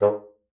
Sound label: bassoon, G#2; Xsample VOL-6